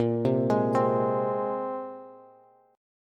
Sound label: Bb 7th Suspended 2nd down, Bass; Bb7sus2; arpeggiated; JGuitar